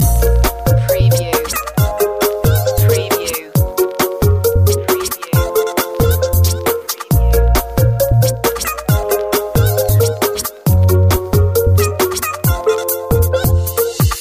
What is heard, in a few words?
glitch pop loop